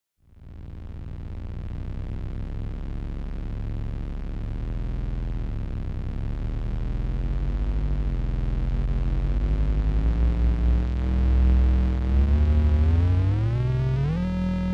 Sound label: Low Electronic engine sound